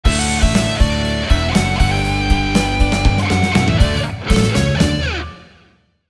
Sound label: 90s drama jingle short